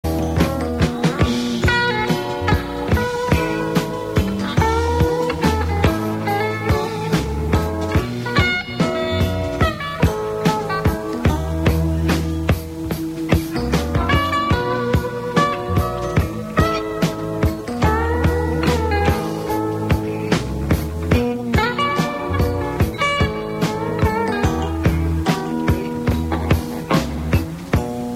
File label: delay